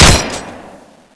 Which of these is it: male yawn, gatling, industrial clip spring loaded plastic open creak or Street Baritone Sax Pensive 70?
gatling